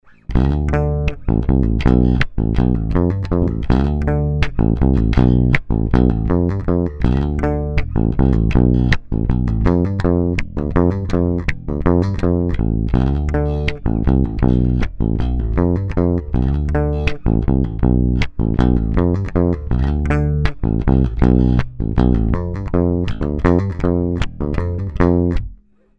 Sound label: String Mute; MusicMan Stingray US 2 Eq